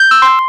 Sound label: lose